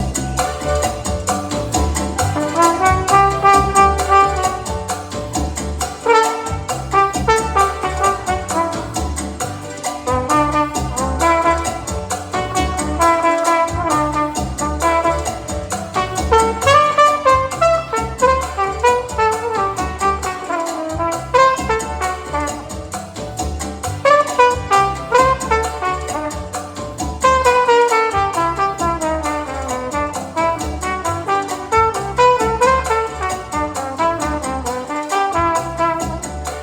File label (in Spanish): Flugelhorn Solo Música Cubana, Son cubano